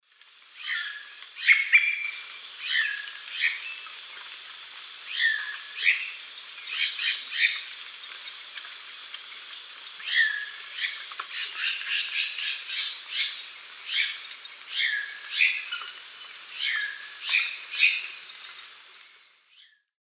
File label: Turdus lawrencii, calls; S. America; Eastern Lowlands